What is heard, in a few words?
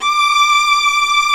STR, Vlas Sect, Agitato